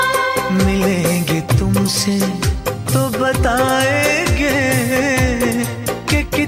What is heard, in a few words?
Old Ringtone